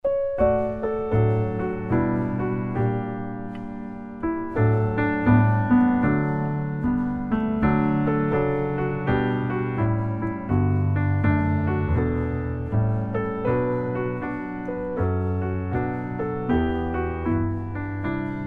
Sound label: piano